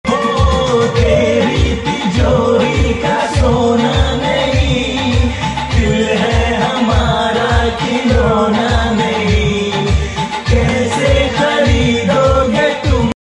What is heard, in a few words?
sad hindi song